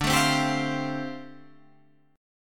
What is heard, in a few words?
G 7th Suspended 2nd Suspended 4th D